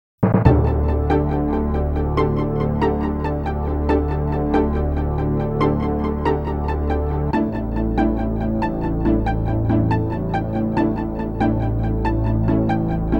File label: Tension; front mission original sound version